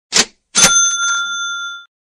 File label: money ka ching sound effect